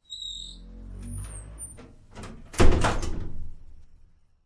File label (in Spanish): compuerta escotilla